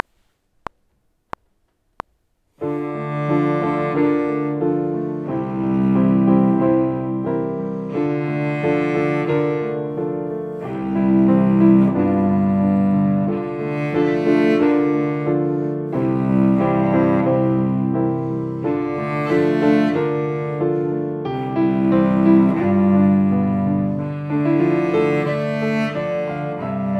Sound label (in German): cello klavier; Playalong; Track 01 – Playalong 01 Cello + Klavier; digitalen Celloschule (1. Band)